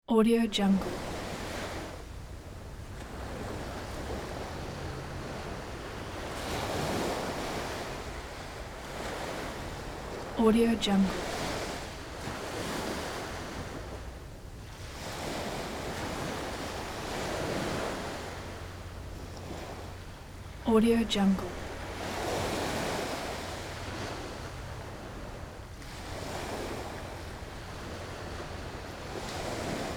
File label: tranquil ocean waves